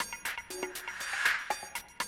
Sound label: 120, Classic Drum Loops